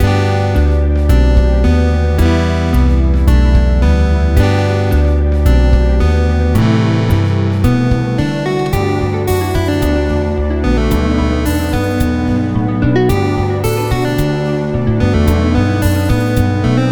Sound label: the guitar village